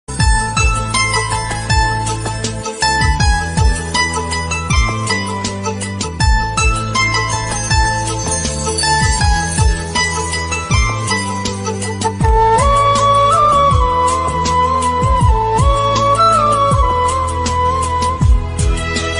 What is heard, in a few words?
bgm ringtone love ringtone tamil love bgm romantic bgm